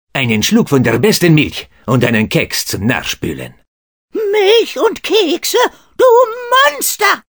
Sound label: slam